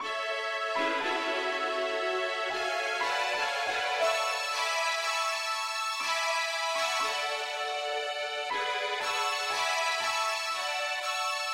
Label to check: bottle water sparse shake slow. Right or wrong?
Wrong